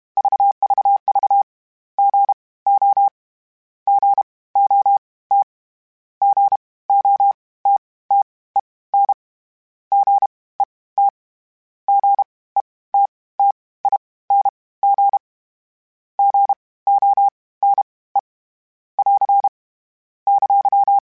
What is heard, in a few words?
Morse Code, CW Code Groups; Mixed